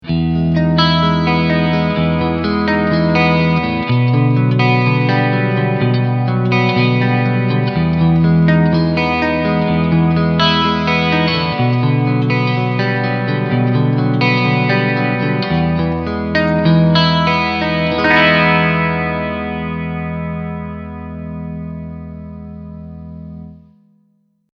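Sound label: Singlecoils Front Clean, guitar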